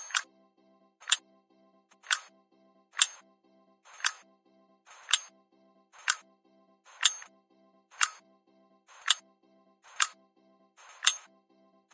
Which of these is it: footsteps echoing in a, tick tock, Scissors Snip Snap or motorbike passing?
tick tock